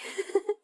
笑い

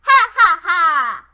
ハッハッハー

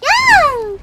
悲鳴; 音MAD; Cookie

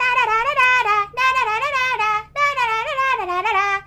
ラララララーラ♪; 音MAD; Cookie

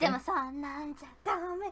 （アカペラ）

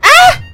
悲鳴

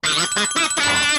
時計（昼）; ドナルド

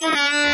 時計の音; ドナルド; 引き伸ばし

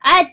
ああっ！

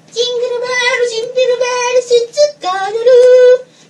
ジングルベールジングルベール鈴が鳴るー♪; 音MAD; Cookie

